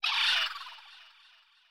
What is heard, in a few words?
Vulture Call; Super Mombo Quest